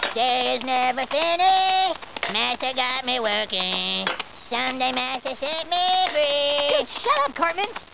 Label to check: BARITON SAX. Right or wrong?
Wrong